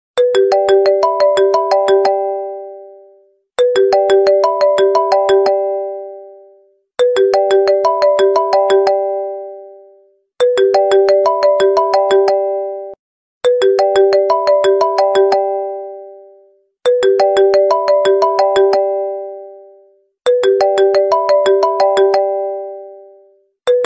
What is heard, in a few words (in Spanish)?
iphone clasico